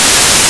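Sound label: crossbow fly, weapons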